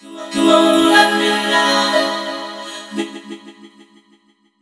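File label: LET ME LOVE, VOCODER, Funky Vocals; USB Soundscan vol.18; AKAI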